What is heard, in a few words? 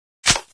mp5 clip in; Source Engine; cstrike; weapons; mp5navy